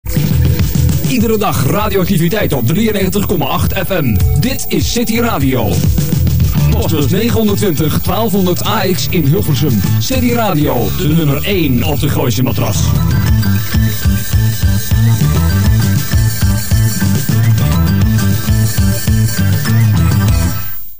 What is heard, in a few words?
city jingle